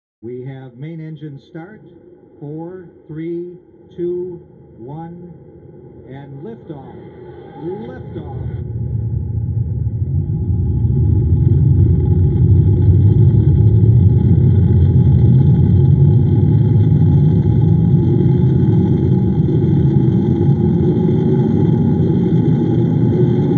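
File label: rocket, Active Sound System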